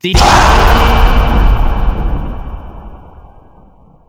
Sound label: surprise shout